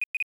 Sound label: Walkie Talkie Active End Haptic; i OS; iOS10; nano